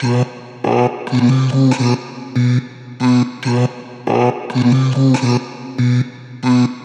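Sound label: Vocal Loop A minor 140 BPM; Vocals; GhostHack; Ghosthack - Advent Calendar 2022 - Day 12 - Vocal Loops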